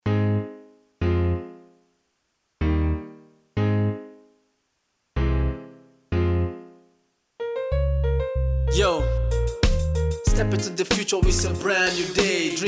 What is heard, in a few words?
Anthem